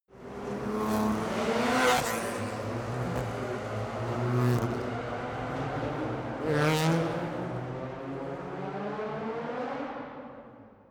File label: racing car f1 drive by sequence